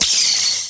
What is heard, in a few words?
down glitter shot